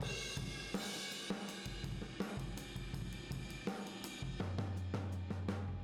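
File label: Boogaloo Fill Room mono, 82 BPM; Sonic Foundry; Drums from the Big Room The Mixes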